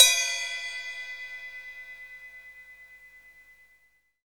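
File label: hi-hat latin; Roland L-CDX-01; HH Modules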